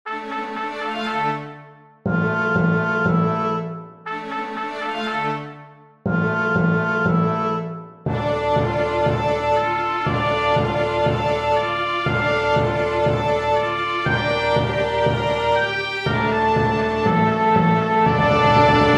piano concerto

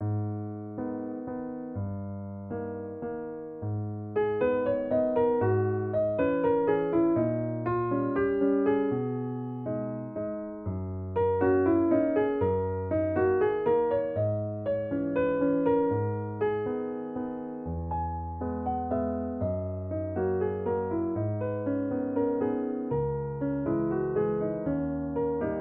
waltz

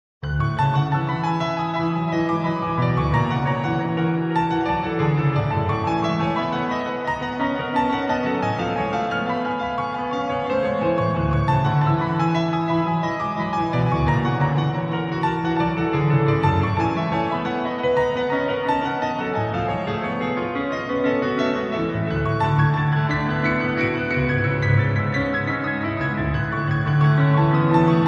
Arpeggio Etude, for piano

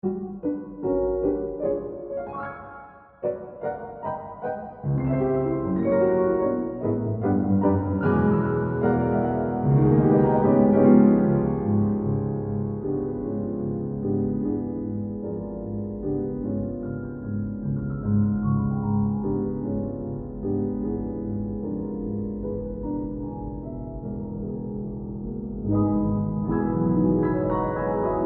Piano

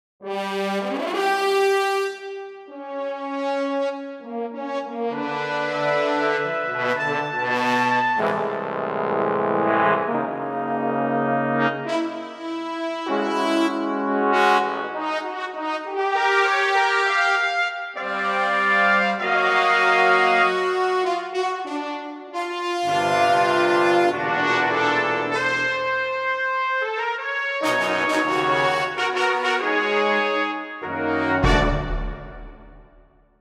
fanfare; Brex

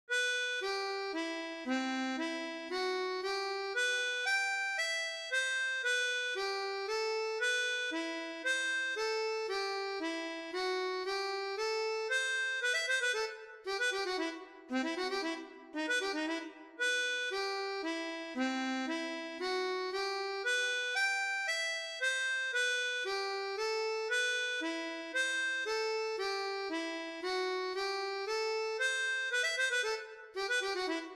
Soliloquy for Chromatic Harmonica; No. 1; This is my first soliloquy for chromatic harmonica.

